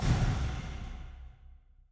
spin Button Click; jumanji

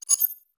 ui teleport menu confirm

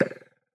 Bullet Puff

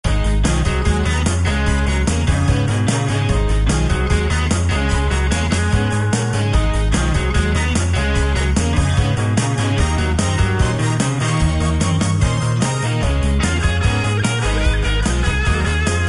music; BombIt5

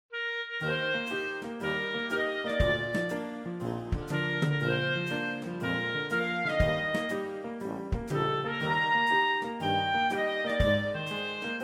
Upbeat Bday, Happy Birthday

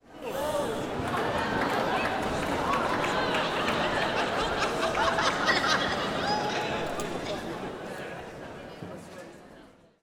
crowd Reaction theater big Reveal; spellbound

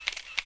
screen shot

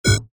tip error

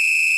normal slider whistle; argon

